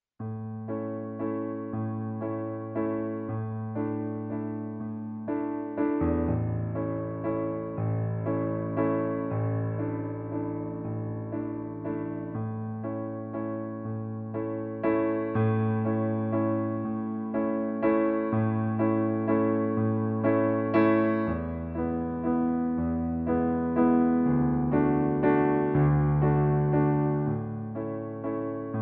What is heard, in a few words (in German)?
Playback D-Dur